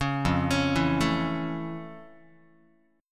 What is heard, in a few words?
F Augmented Major 7th F Guitar; F+M7; arpeggiated